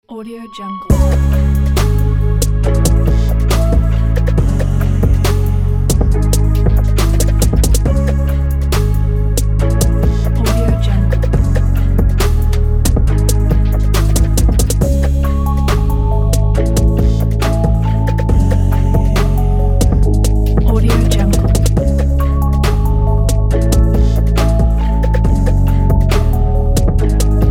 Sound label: chill cocktails